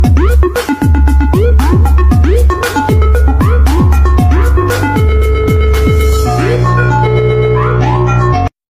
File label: speed song